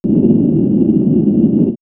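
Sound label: Sub Hum, electronic fx; X-Static Goldmine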